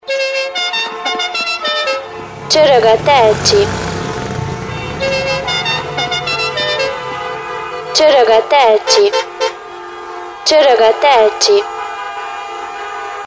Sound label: Ringtone; nokia